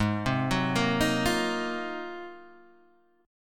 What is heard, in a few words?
Ab 13th Guitar DADGAD, Ab13 chord; arpeggiated; JGuitar